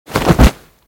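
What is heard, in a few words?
crushing double kick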